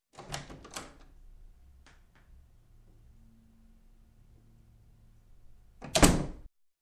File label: Office Door opening and close